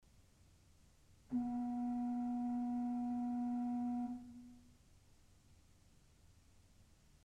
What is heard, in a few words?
Principal 4' H, pipes, ORGEL